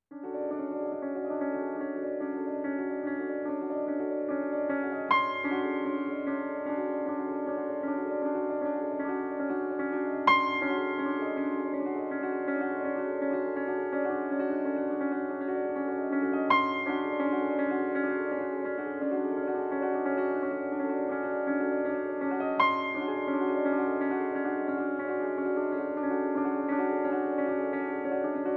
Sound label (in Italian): Pianoforte